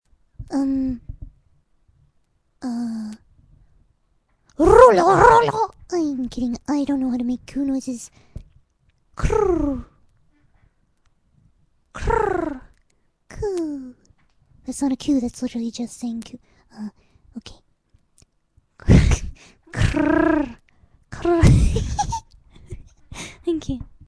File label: make some cooooo noises; Fluttershy